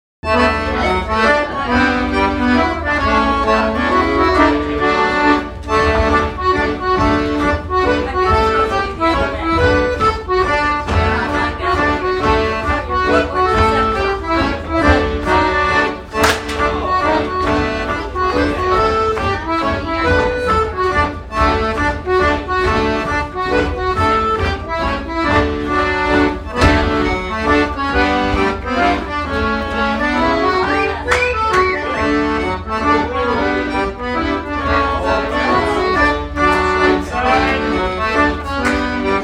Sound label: Jämtpolska